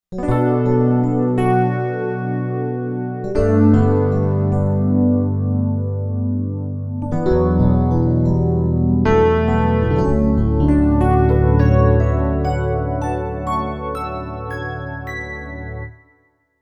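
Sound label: Piano Hybrid Rhodes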